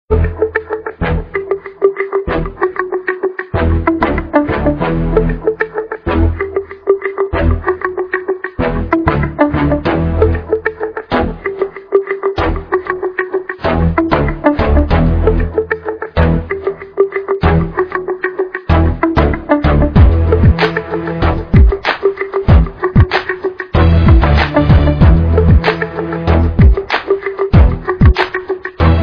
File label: Instrumental Albania